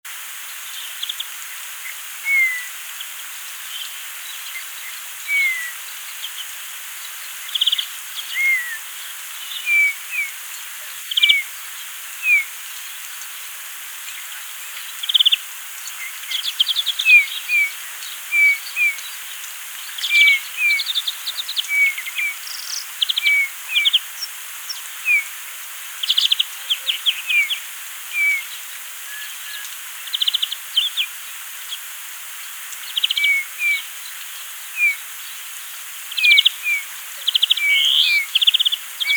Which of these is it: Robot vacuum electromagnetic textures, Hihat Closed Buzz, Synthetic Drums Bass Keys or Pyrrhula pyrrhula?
Pyrrhula pyrrhula